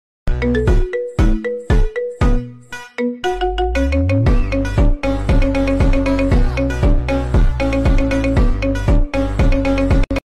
Funk Ringtone

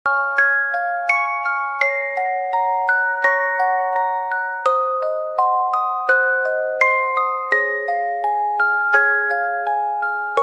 wordless lullaby

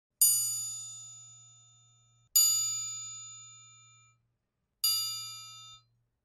Triangle

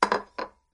saucer, استکان نعلبکی

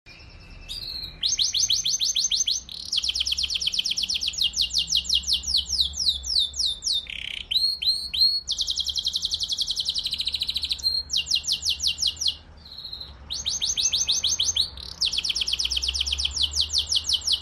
male canary

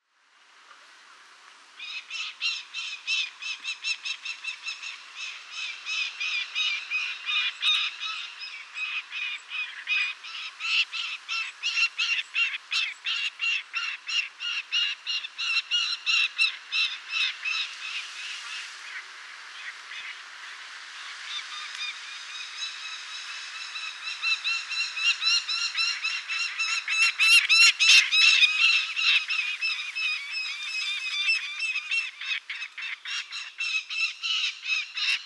Lanner-falcon, پرنده لاچين

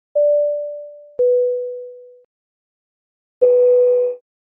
Airplane Beep

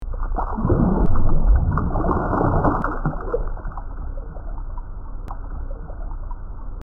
underwater waves

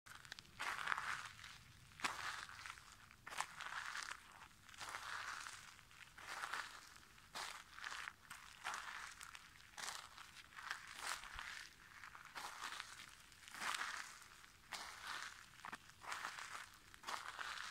Walking slowly on sand

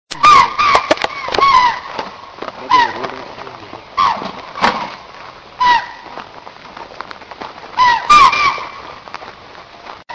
Scimitar oryx; تیز شاخ آفریقایی

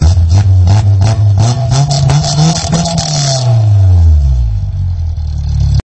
exhaust 220; اگزوز بنزی ۲۲۰

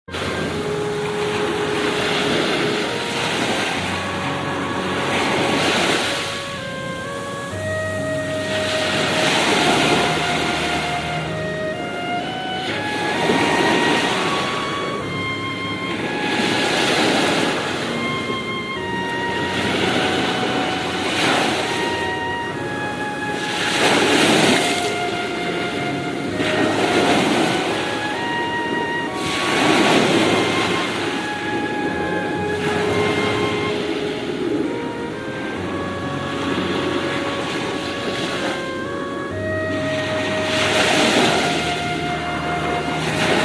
sea and violin

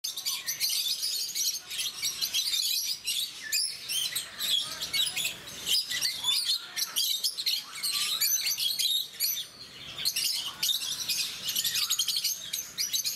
sound rosy faced lovebird

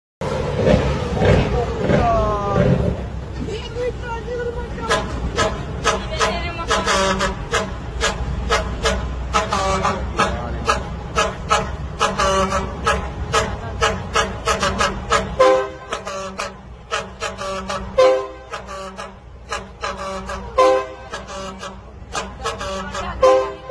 trailer truck horn

